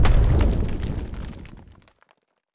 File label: new artillery; ambient; World of Tanks Blitz; neptune